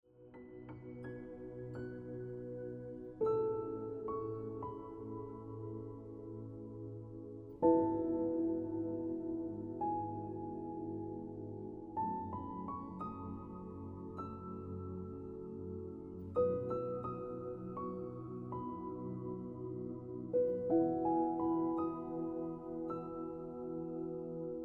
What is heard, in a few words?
Looking Back Ringtone Piano Music